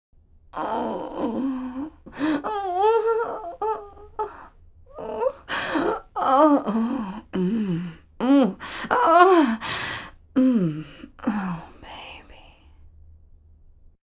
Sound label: old vocals